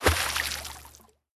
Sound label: Bobber Splash; candy crush saga gamerip